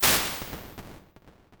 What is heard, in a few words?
Delay+Hall, impulse responses, warped reverb; Medium Spaces; Apple